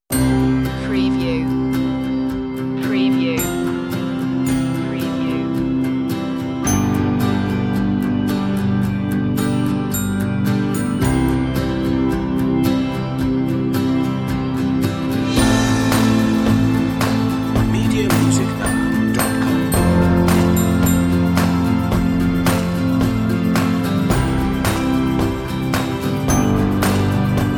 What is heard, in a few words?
bell lead